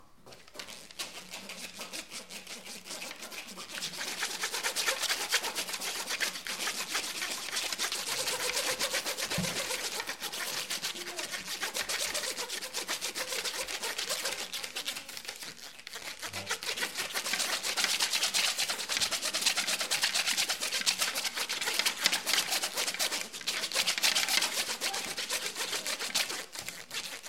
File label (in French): improvisation carton ondule